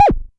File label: Amdek Perc